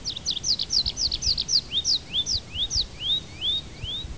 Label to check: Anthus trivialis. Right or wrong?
Right